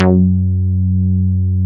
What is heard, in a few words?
Tekkno Bass; Best Service Gigapack 2